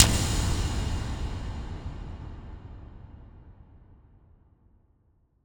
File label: Horror Hit Bright; Music; Haunted Bloodlines; SFX Producer